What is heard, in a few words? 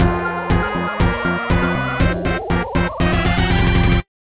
Speed Up!